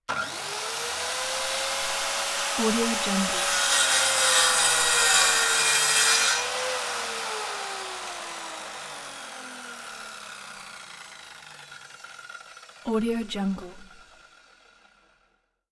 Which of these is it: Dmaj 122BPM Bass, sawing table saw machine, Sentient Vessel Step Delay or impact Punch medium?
sawing table saw machine